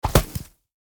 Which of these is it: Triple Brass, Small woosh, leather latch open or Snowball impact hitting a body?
Snowball impact hitting a body